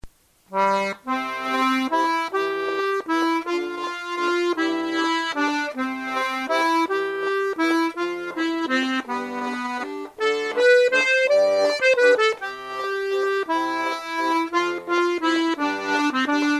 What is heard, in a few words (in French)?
valse